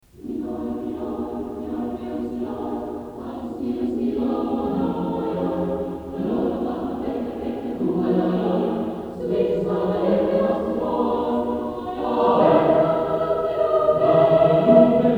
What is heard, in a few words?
Minoi Minoi SATB